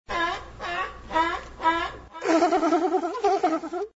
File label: duck